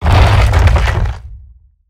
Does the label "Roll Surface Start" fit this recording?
Yes